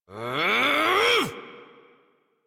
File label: Exert Adult, Melee; Warframe; Duviri; Drifter; en